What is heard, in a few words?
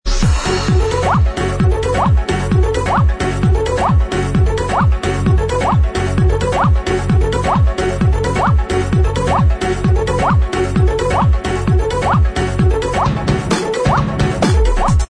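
backing vocals, track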